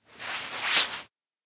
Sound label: wrap rope; koyomi; anemoi